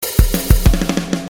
bateria

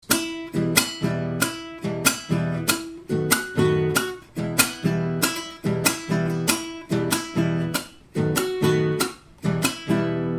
chacarera guitarra

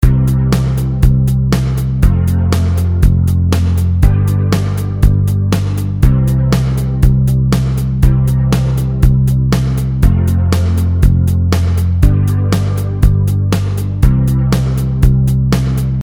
bajo; linea de bajo

